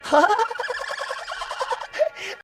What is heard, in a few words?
boyish giggles